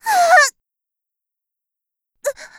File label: die; Eternal Return; Tia; Voice Line; KOR